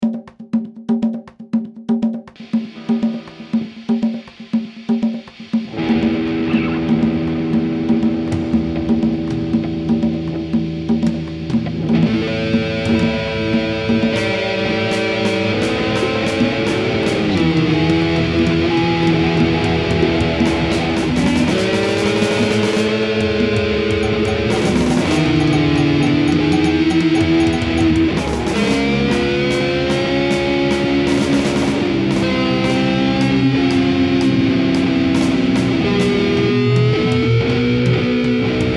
Messy Song, Battle Music; Hylics

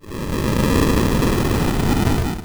boss die; Hylics

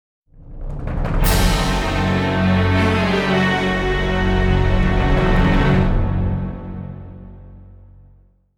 Jingle; Fate Tiger Colosseum; Fate-Tiger Colosseum ORIGINAL SOUND TRACK [Complete Edition]

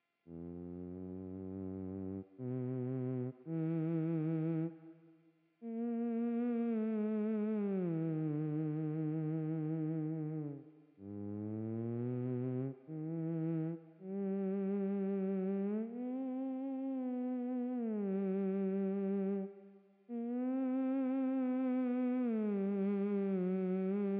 Theremin; Hylics

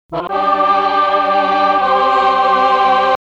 Sing; Hylics

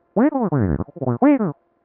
Vocal; Hylics

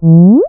evade; Hylics

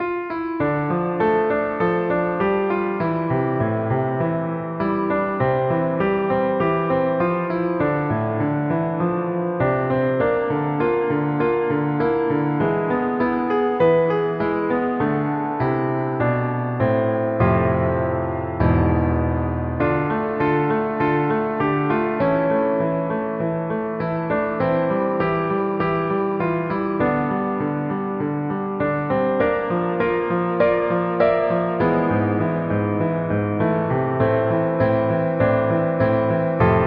A Peaceful Land (Piano)

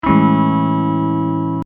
Amaj7 up; Hylics

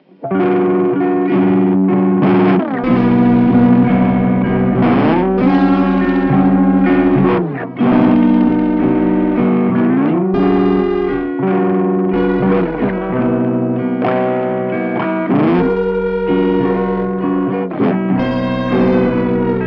Distorted Guitar strum; Hylics

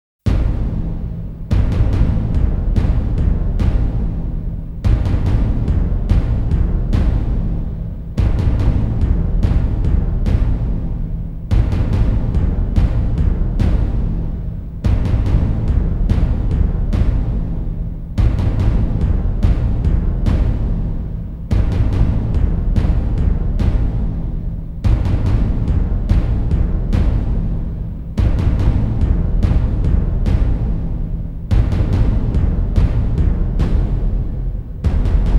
taiko ver.; Fate Stay Night Soundtrack Collection; Fate Stay Night Original Soundtracks

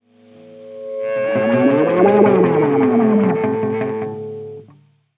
Shuriken; Hylics

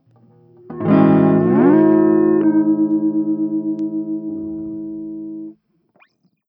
Calibrate; Hylics

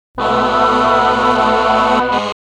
Sing; Hylics

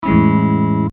Amaj7 down; Hylics

